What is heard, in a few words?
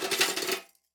belt, pkm; svencoop; ins2